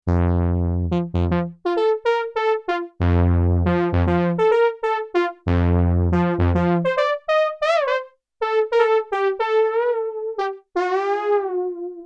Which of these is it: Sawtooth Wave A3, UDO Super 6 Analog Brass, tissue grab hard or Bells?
UDO Super 6 Analog Brass